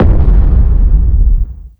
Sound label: Sonicboom Hit